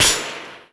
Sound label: METAL HIT